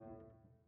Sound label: piano harmonics, A2